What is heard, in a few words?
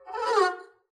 arco noise high medium, double bass; dsmolken; noises